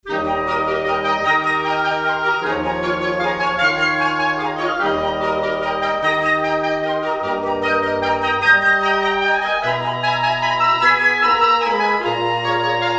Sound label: Woodwind Transition Maestoso; Zyxx; Season 4